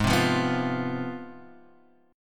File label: C Major 7th Suspended 4th Sharp 5th Ab, chord